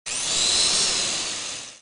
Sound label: hand drill machine